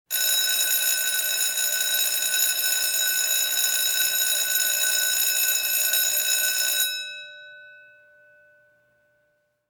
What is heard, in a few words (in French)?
Cloche; Cycle de la violence; Bande sonore piece theatre